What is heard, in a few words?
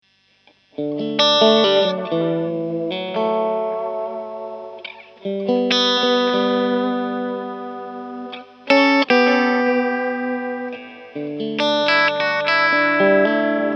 mod